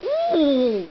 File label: Pain; KKND